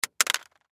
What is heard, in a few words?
Cell Drop; Cell Telephone Closed Drop; Technology; Cell Phones; Electronics; Blastwave